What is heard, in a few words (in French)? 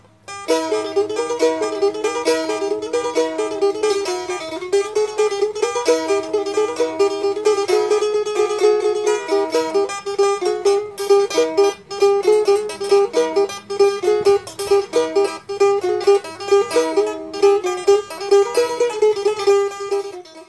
luth indonesie Hasabi